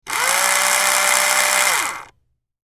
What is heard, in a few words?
whirr